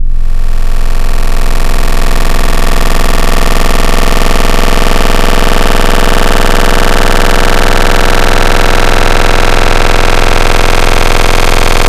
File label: FM Table, FM Wavetables; Cymatics; SERUM